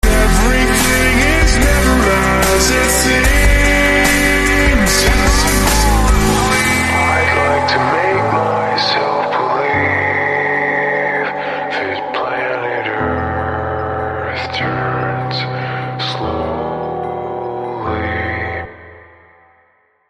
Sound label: slowed sad